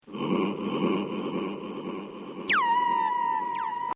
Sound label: Pigs; Animals